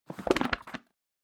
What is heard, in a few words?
Book (Flick Through)